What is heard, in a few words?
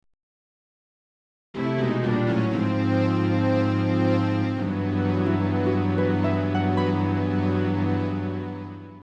Game Over; The Legend of Zelda Ocarina of Time